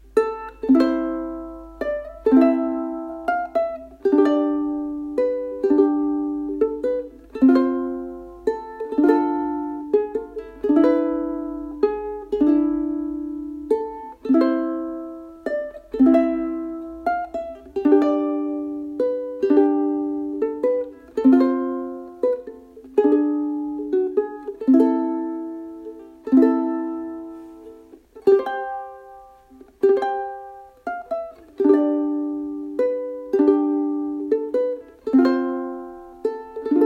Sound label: Greensleeves ukelele